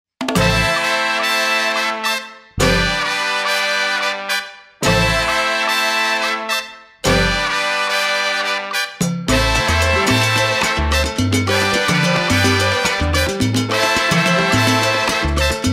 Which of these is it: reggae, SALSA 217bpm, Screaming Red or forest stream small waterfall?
SALSA 217bpm